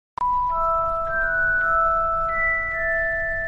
bgm notification

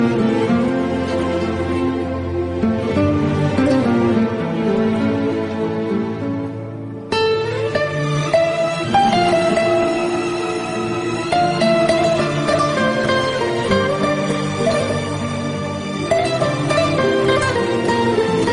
very sad

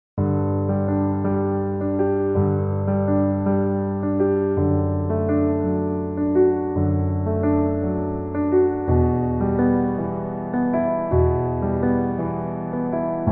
piano cover song

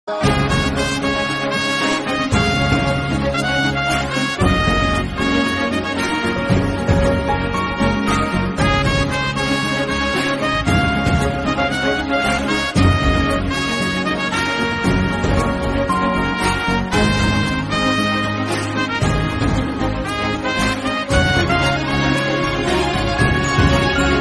bgm; pushpa

